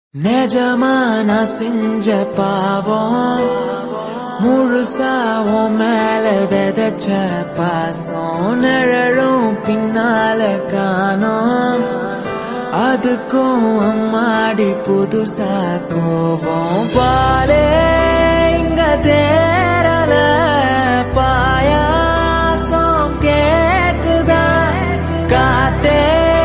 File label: Slowed Tamil Ringtone